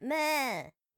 deer short

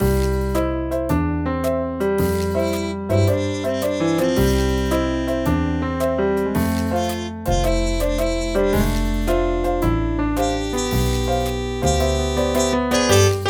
jingle; Carol

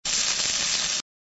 dynamite placement

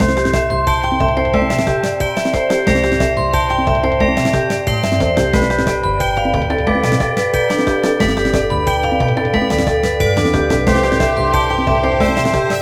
boss victory

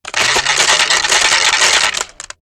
woodchipped

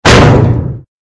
drop big weight miss

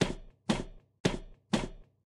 footstep walk loop pipe

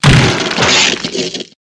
peeling the bark

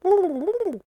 turkey med

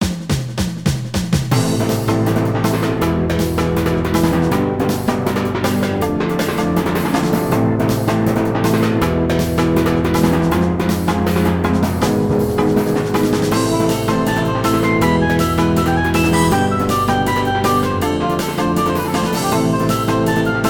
Building early

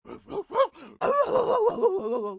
dog long howl

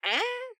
armadillo question

